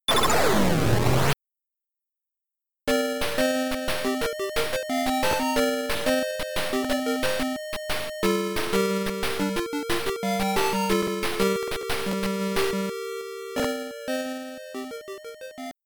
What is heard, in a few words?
RINGTONE